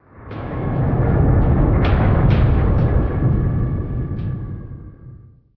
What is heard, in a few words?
Ambient; unreal development kit